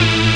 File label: BUZZ; E-MU Producer Series Vol. 2; More Studio Essentials; Composer; Technopourri